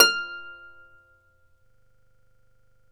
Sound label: Celtic Harp, Plucked Strings